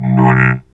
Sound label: VOX VOX, Electro Vox